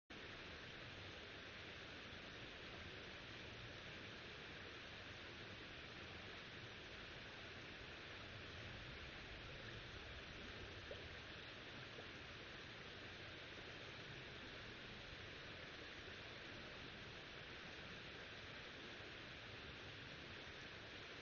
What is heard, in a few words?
riverside; Kyoto U; Ashiu